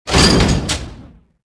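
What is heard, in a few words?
footstep, atst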